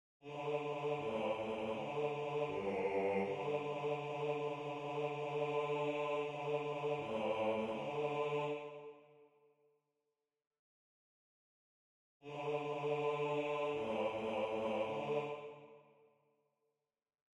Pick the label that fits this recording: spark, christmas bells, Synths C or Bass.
Bass